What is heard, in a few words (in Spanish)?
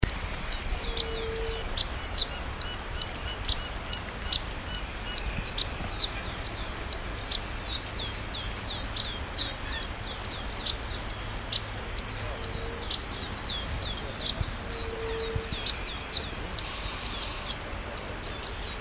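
Colibrí Mediano, Colibri serrirostris, White-vented violetear; cantos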